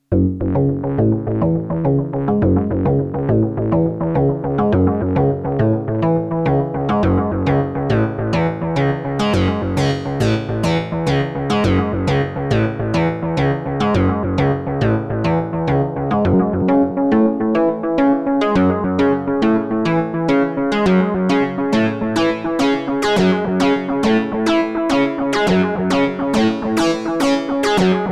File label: Behringer Neutron Synthesizer LFO Sequence